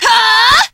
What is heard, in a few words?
Kick; Spinning Bird